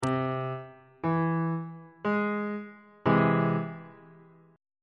d double sharp augmented 2nd inversion on bass clef